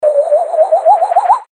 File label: swirr